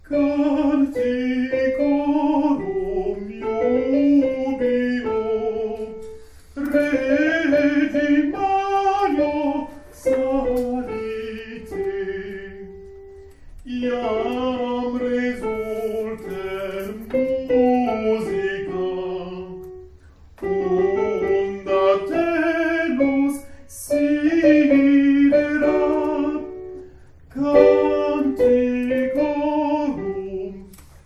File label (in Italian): Soprani; CANTICORUM